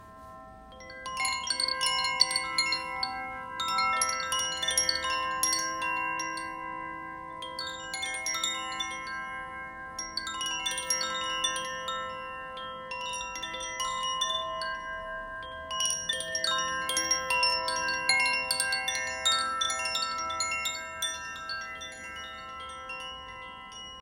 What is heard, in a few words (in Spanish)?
Carrillon